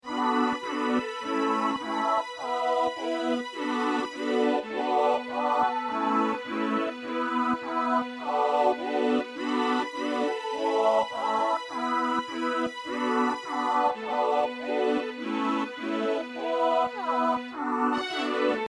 vox pad and strings 103 bpm

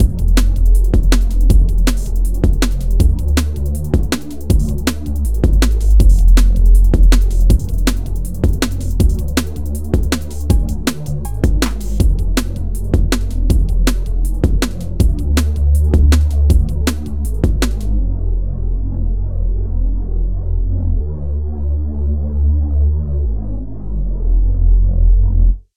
Reese Bass

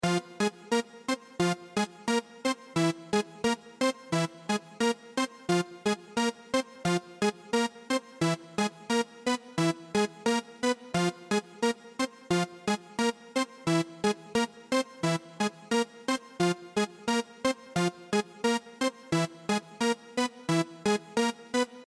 melodic sample

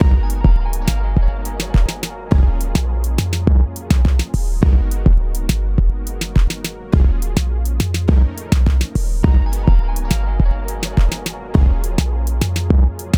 loop full 104 bpm

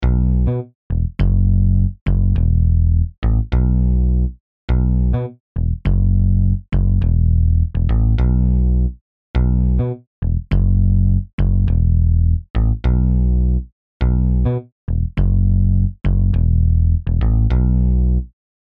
bass line solo 103 bpm

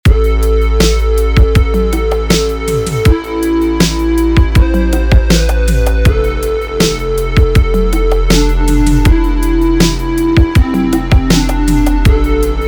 Jupiter Poly Hollow

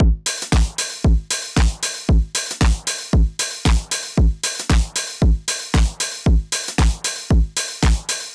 Drum Pattern

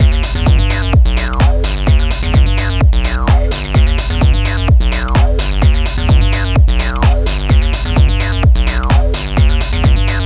Acid Bass

